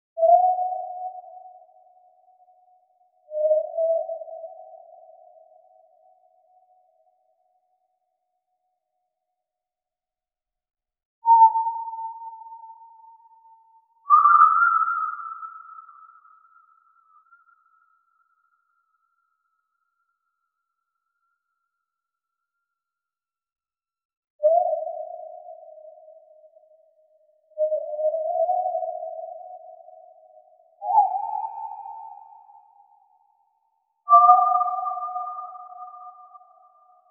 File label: series of quick scifi teleport; teleportation